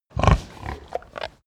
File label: pig grunt breath